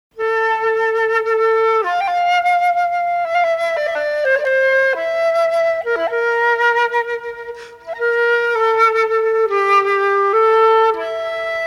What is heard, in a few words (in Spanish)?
Misterios de la llanura flauta